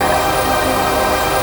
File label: RAVE PAD; Best Service; XX Large; Pads